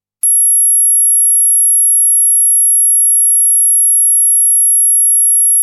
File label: Dog Whistle